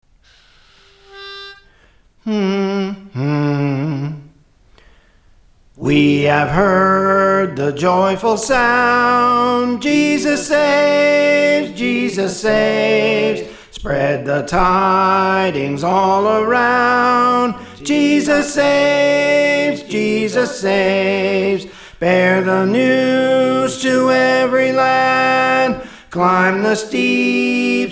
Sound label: Soprano; Praise For The Lord Training